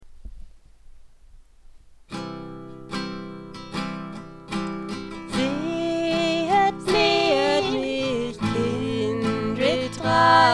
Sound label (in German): Sehet mich zweistimmig